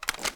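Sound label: weapon foley assault rifle reload fast end; weapons; gmod; viper; scharlie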